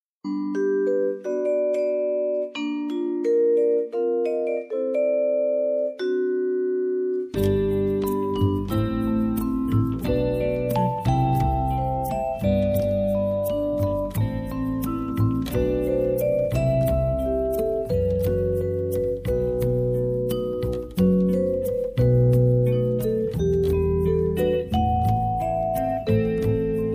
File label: Vibraphone